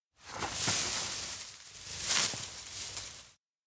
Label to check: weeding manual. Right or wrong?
Right